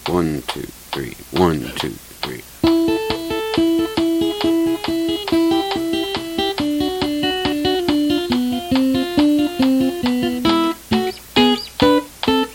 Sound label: intro guitar